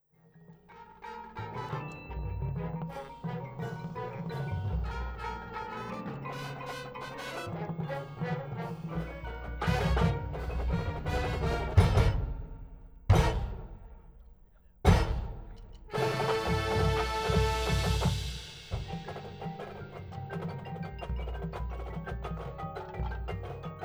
Drum Corp (Moving) Test A; IsoMike Tests 2006

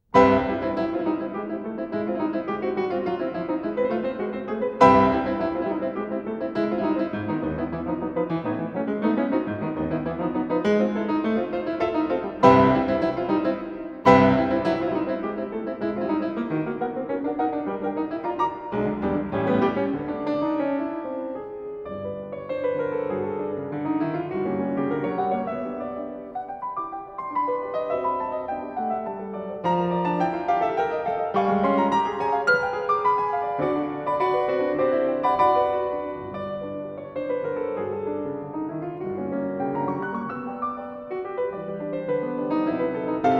Intermezzi for piano in C major; Poulenc; Piano Music

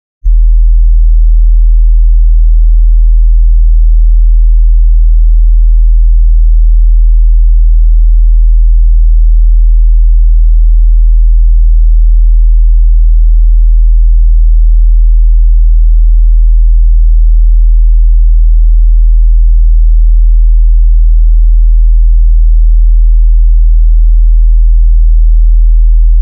40hz Sine Wave 0db L+r; Denon Audio Technical CD

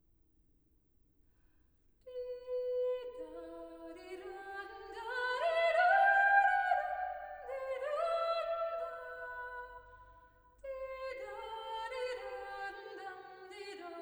Folk tune, for piano; Folketone (Folk tune from Valdres); (Stimmungen No. 4), Op. 73/4; Grieg

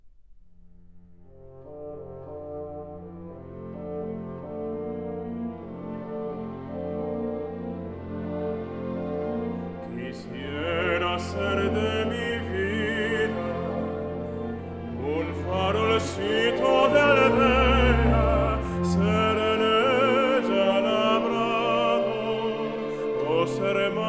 song for voice & piano; Anhelo

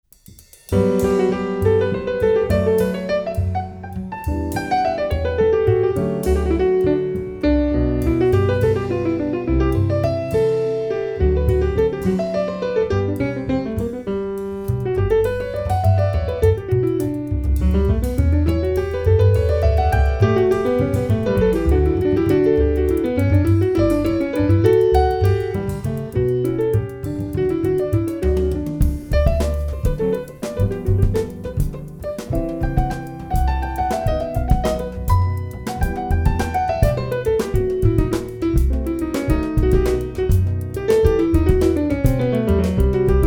Variations, for keyboard

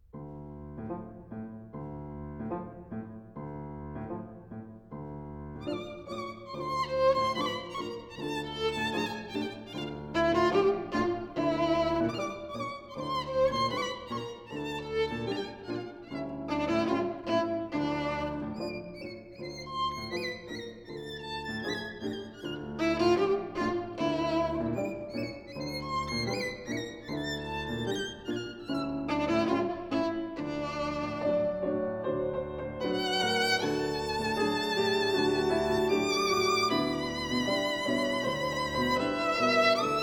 for violin & orchestra, Moderato; Fantasy on Bizet's ＂Carmen, ＂

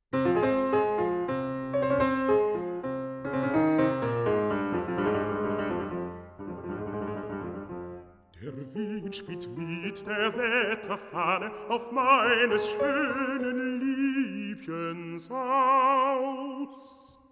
song cycle for voice & piano; Book I, Die Wetterfahne; Schubert; Winterreise

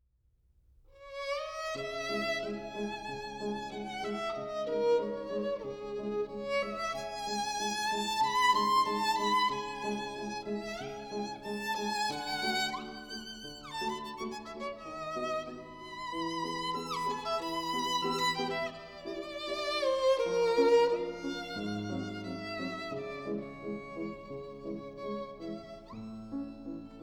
Preludes for piano, C sharp minor

